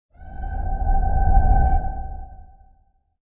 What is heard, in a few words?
Whoosh